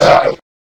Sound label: zombie pain